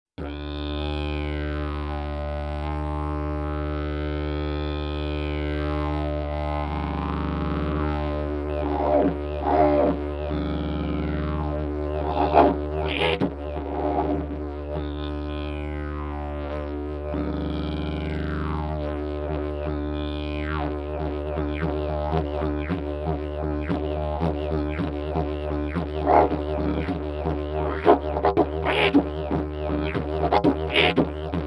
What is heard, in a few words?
didgeridoo; javor mandala